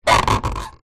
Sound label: foley wood polished run creak hand